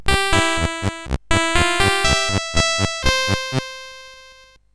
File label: sid, CSG8580R5; Aquantor